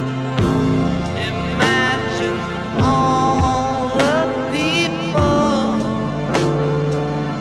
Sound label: Pop